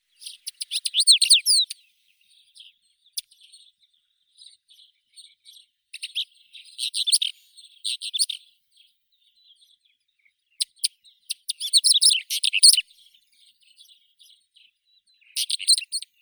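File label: pied wheatear, Oenanthe pleschanka; kamenka pleshanka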